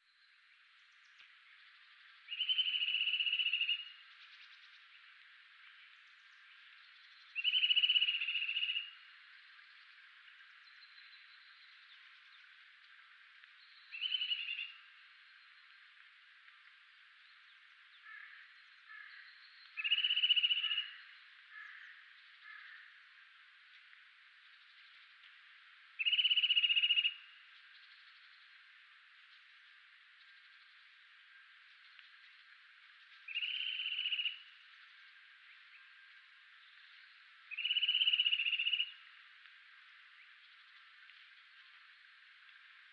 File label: Sitta europaea; SETE